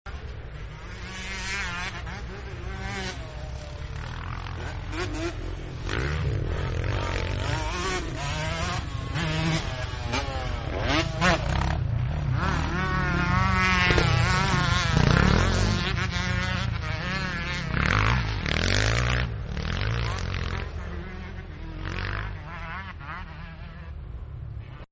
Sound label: Moto X Loop, motorcycle; Vehicles; Partners In Rhyme